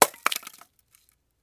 Camera Flash Bulb Sm, flash pops

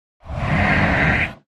Space Door Close Sli, doors high tech

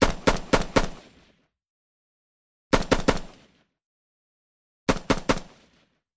M16 Single Repeating

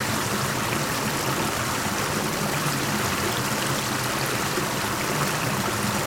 Stream Sml Running, streams

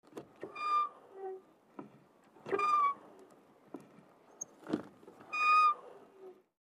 Barn Door Swinging Oc

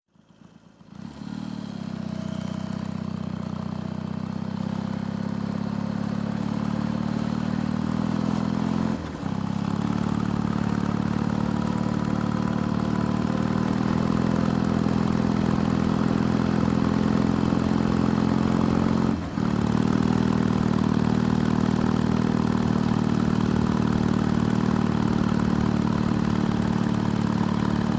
Motorcycle, 500cc, Steady Cruise, Saddle Pov

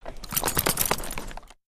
Carriage Horses, carriages

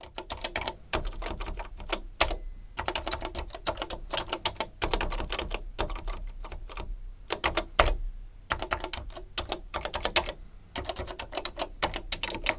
Apple Iie Terminal T, typing

